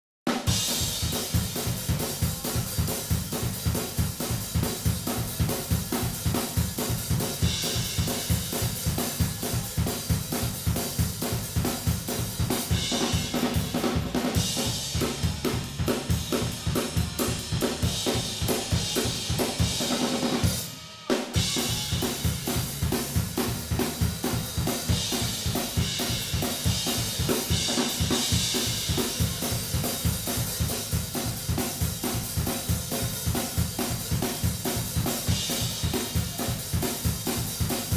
Cymbals

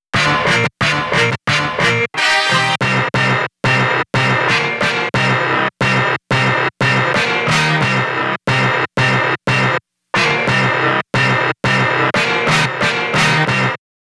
HIT

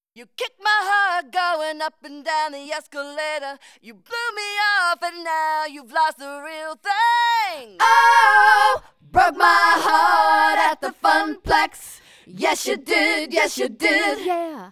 Vocals

